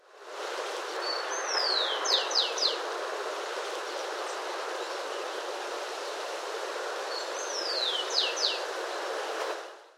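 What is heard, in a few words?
Rufous Collared Sparrow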